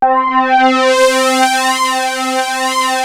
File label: POLY PAD C4, Moog; USB Soundscan vol.49; Analog Fantasies; AKAI